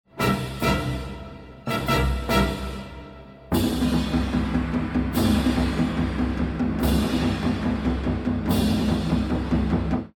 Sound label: bomb ten sec count; music kits custom